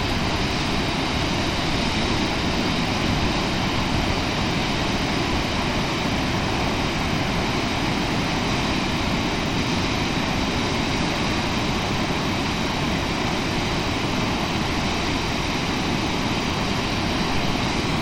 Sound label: Niagara falls